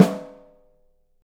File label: OHSTSN R, 3D Drums